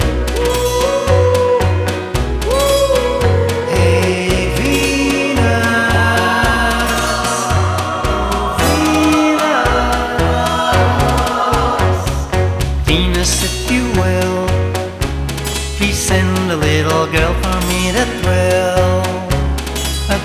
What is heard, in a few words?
less toppy echo B key